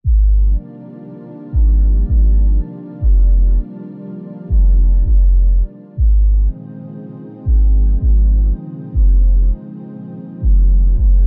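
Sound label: soulful vocal version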